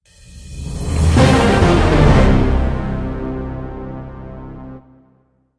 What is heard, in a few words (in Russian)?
zvuki dlia viktoriny